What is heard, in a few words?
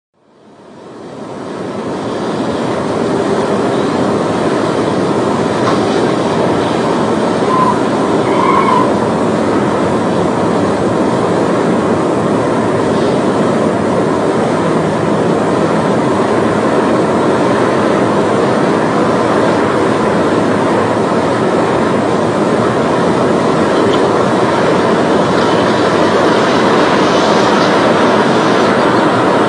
tornado f5 audio